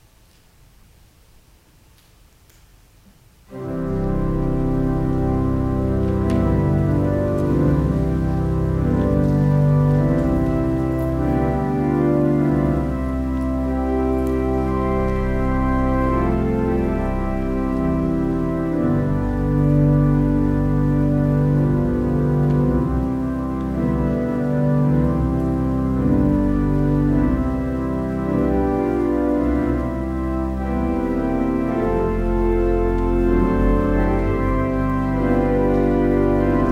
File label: Organ Music; The Lord's Incomprehensible Goodness; Netherlands Reformed Congregation, St. Catharines, ON